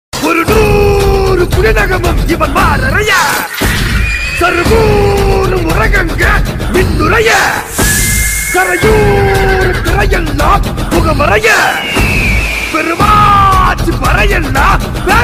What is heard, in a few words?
Glimpse Bgm; Kanguva